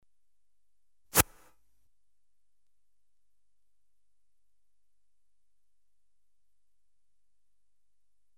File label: Inverse gun (single shot), Electronically Generated Sounds; Sound Effects Library